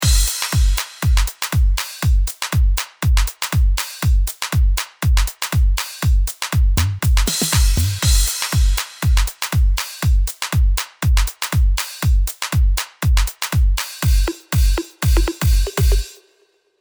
Beat EQP Bypass Mode

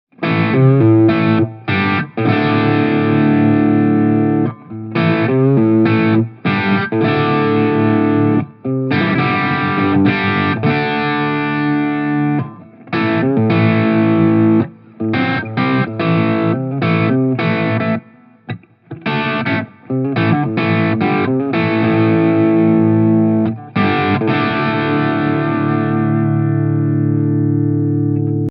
Blackstar St. James 50 EL34 Crunch Kanal; XLR

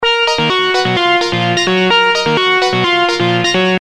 Happyness 128 bpm

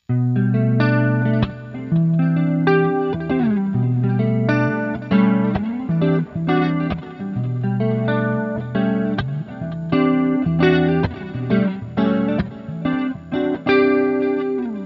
Neck PU w. Effects Tone 50; George Benson

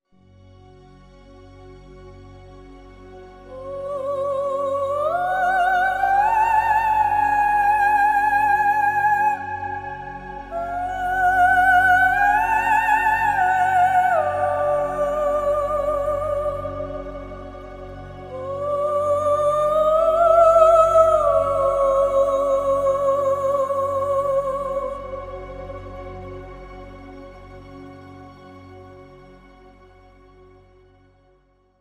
Lyrical Vocal Phrases; Sonuscore

